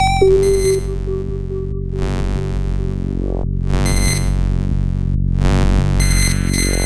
Synth F#m, 140 BPM; loops; CHIPSHOP DELUXE

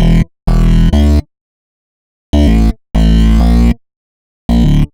Bassline 97 E, hiphop, 97bpm, Bass; musicradar

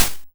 YM Perc, DRUMS; ChipShop Deluxe; ChipShop Hits

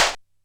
Snare, techno drum

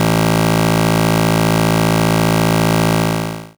GB Bass A, chip; chip hits; CHIPSHOP HITS

